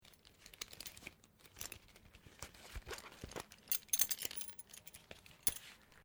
Belt Leather Undo; Movemement sounds for various articles of clothing; Dressing; Human

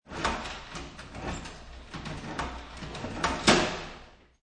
Bathroom Towel Dispenser; Paper towel being tear from dispenser in a public bathroom; Public Bathrooms

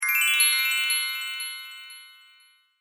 Pantomime Fairy Appears; Cartoon Sound Design Sound Effects

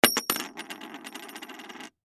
Coin Drop Single; Money; Foley; Pirate Gold; Objects; Blastwave

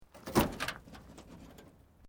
Metal Light Movement Minor; Materials

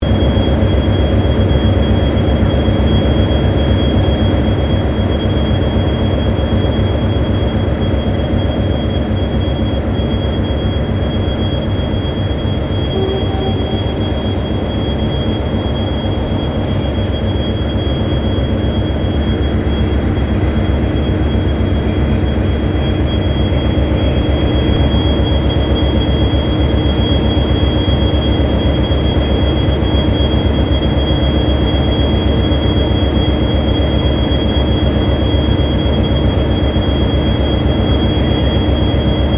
Ambience Railway Station Freight Diesel Close; General Ambience at Southern Cross railway station; Train Station Sound Effects; Foundation1

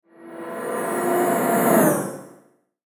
Whoosh Fly By Shimmer Metallic; Blastwave; Whoosh Sound Effects

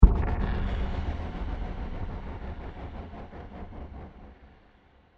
Mysterious Impact With Delays And Scratches; Digital; Crafted Signals

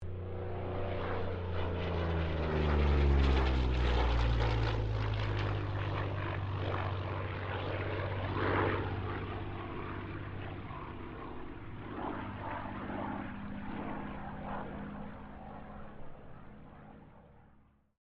AIRCRAFT P-40 KITTYHAWK PASS STEREO

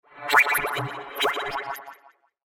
Laser Beams And Bleeps

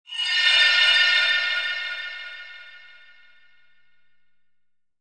Sweeper Fly By Spacey Shimmer; Production Element Imaging Element Accent Transition; Sweeper Sound Effects

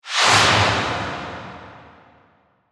Whoosh Airy, Movement, Pass By, Metallic; BlastWave

